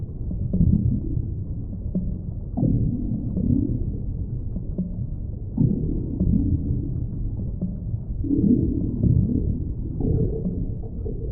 tiyanki reanimated attack; Stellaris

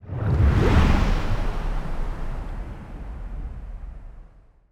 colony ship move; aquatics

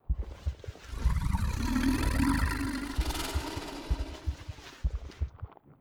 space dragon idle; Stellaris; guardians

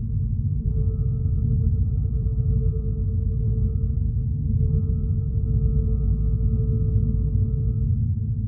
ship idle; Stellaris

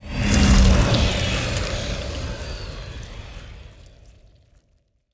ant ship mauler move; biogenesis

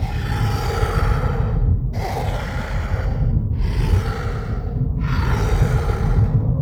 space whale idle; Stellaris; other entities

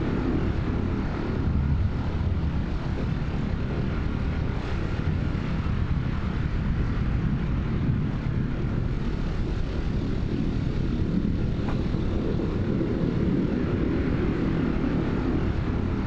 ship juggernaut idle; Stellaris; machineage; ships

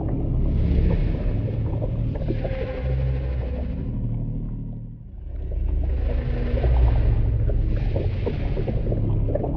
void worms mature idle; Stellaris; grand archive; ships

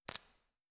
wraith spark; Stellaris; guardians

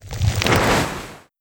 swarm station explosion large; weapons; Stellaris

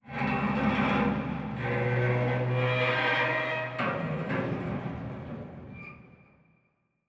abandoned ship stinger, ambient; Stellaris

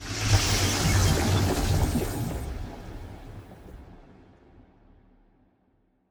ship science ship move; Stellaris; machineage

